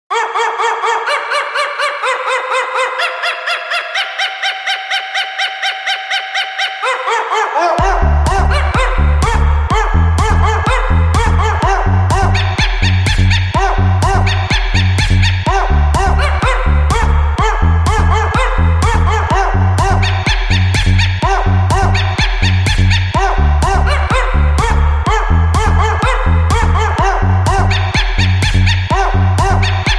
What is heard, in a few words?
Dog Ringtone